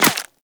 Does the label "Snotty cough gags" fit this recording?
No